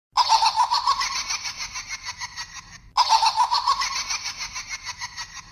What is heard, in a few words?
laugh; Joker